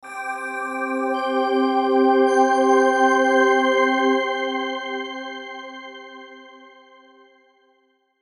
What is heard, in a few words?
Musical Bells Shimmer Swell, Bell Element Dark Scrape Vibrato; Blastwave